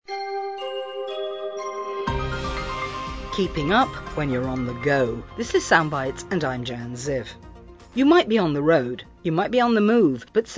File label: drum up